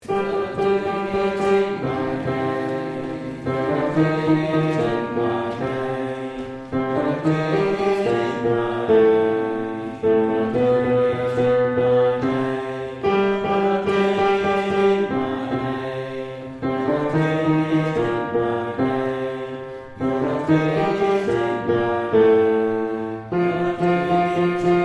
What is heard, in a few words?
Chorus Bass